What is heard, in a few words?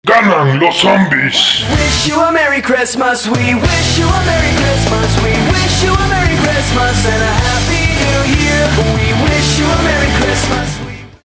zombie win xmas